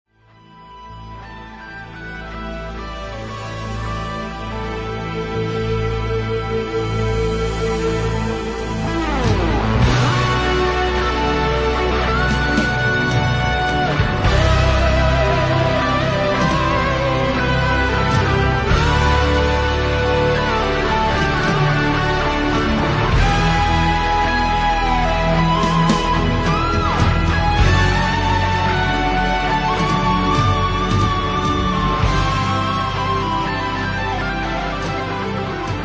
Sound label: FANFARE